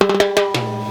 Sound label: LATIN FILL, DRUM FILL; Crypton - DrumBase5000